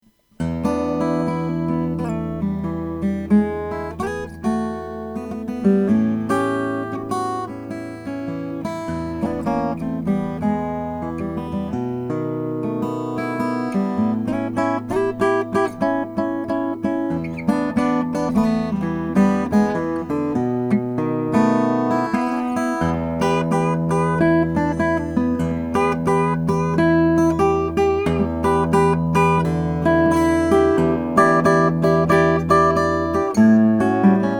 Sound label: kiso klein omk 3 persimmon